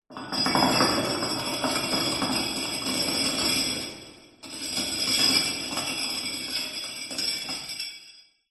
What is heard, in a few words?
horror chain large drag ground dungeon reverberant